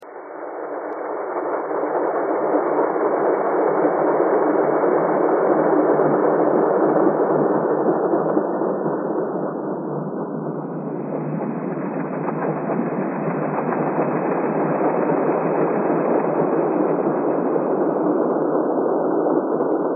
thread noise

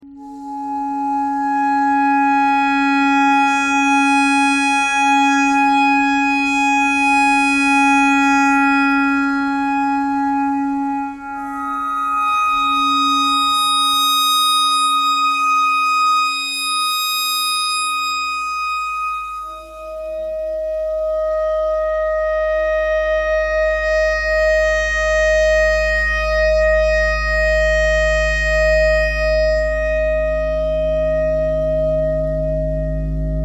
clarinet notes e clarinet thread pentatonic

